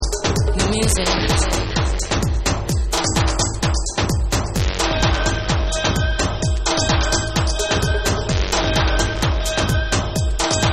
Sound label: Soul Drumz Mix